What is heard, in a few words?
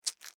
Foley Medicine Bottle Shake Movement Small; Miscellaneous Household